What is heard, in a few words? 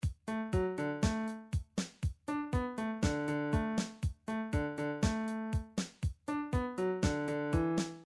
drums reggae